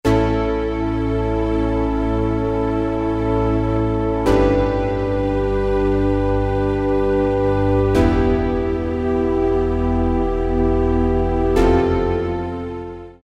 Euro, Yamaha TG33

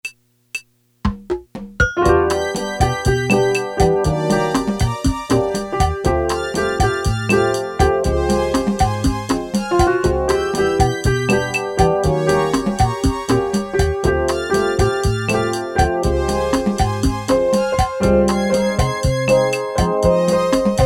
Bossanova, Casio CT-370, Rhythms

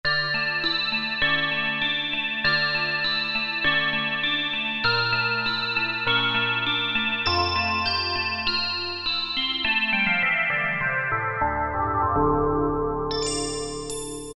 Bell Chime; Factory A

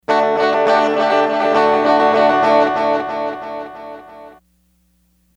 Edge Tone; Sony HR-GP5

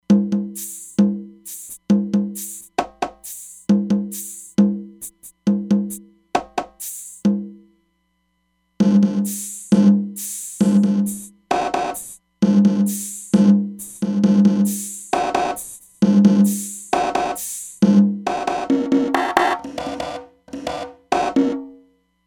Dense Gate, Roland DEP-5; Factory Patches

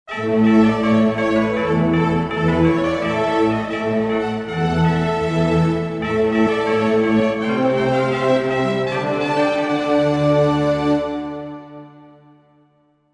Opening Orch, Roland JV-2080

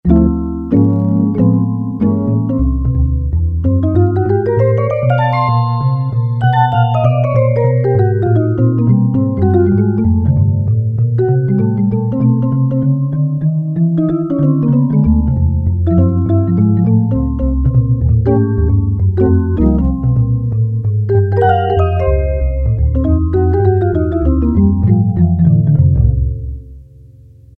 Vibraphone + Jazz Guitar, Casio CT-370; Tones

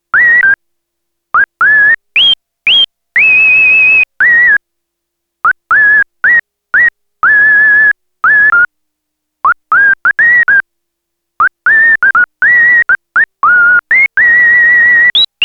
WHISTLE, Roland SH-101; Factory Patches

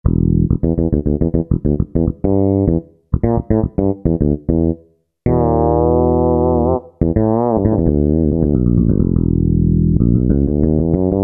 Fretless, Generalmusic Equinox

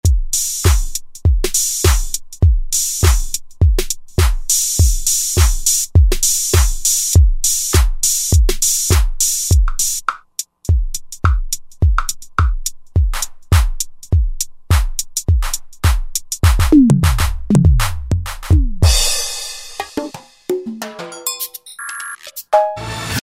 House Drum Set, Roland JV-2080; Rhythm

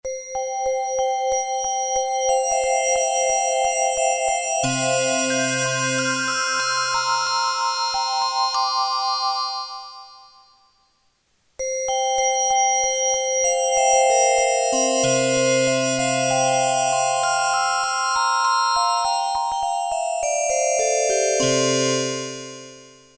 De-Esser; t.c. electronics M300

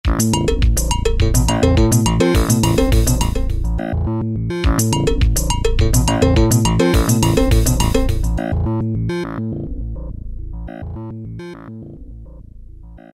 One Note Jam, Roland D-110; Factory Patches

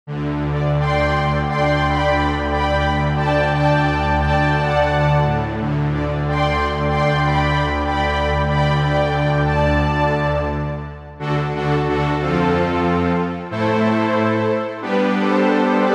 Strings; Roland SRX-07 Ultimate Keys

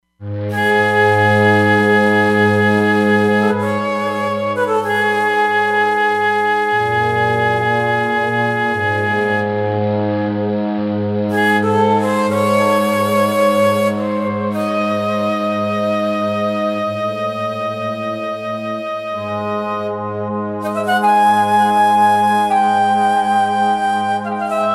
Roland Fantom XR

